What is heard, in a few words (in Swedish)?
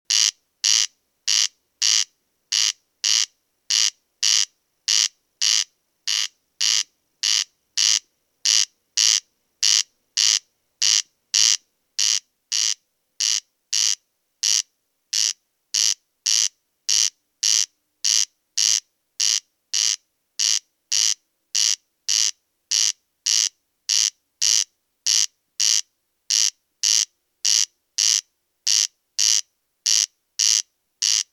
Kornknarr